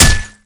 bottle intact hit flesh, weapons

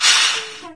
steam release, ambient machines; Source Engine; cstrike

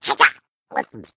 egg tease fail

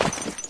dirt, player; Source Engine; dod

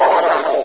con idle; controller; Source Engine; bshift

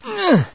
pain; hl1; barney; Source engine

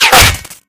bullet impact bot

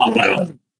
ballbot hot laser

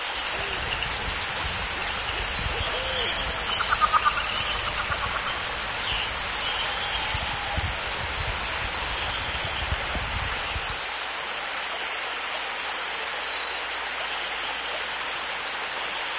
forest amb; ambience; Source Engine; dod

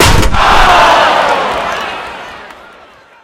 charge hit flesh range

